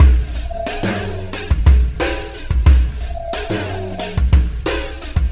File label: Alien Influence Beat, Processed Drumkits; Sonic Foundry (Sony Creative Software) - Processed Drumkits Zero Gravity Beats